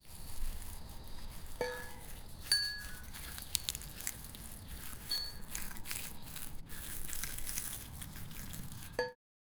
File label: tofu slicing rustling; cowbell